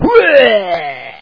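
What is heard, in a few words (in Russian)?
рвотный позыв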